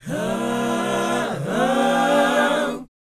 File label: BOYZ, Vocal, R&B Dance